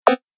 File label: Retro Gaming Sound; Video Game Sound Effects